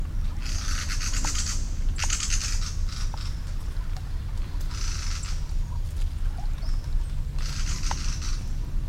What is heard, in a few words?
Mistle Thrush - call, Misteldrossel